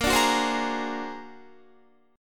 Bb Augmented 9th Bb, Guitar; Bb+9; strummed

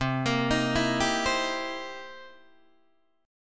C 7th Sharp 9th, Guitar, Open D

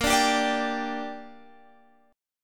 A sharp 6th, Guitar Standard; x,13,12,12,15,15; A#6 strummed; capo=3

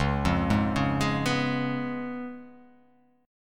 C sharp 7th, C sharp, down

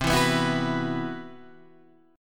C Major 7th Flat 5th C; CM7b5 strummed; JGuitar

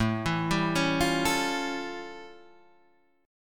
A 7th Flat 13th; A7b13 arpeggiated; Guitar; JGuitar

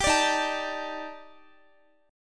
D sharp Major Sharp 11th, Guitar; D#M#11; strummed

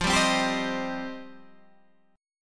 F 7th Suspended 2nd F down

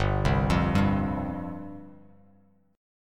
G 7th Suspended 4th G down; G7sus4 arpeggiated

